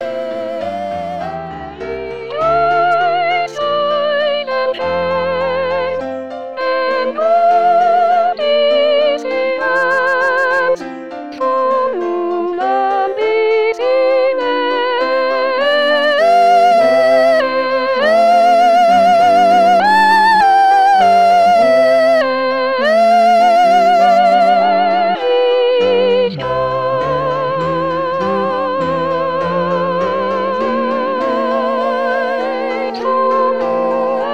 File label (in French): sop psaume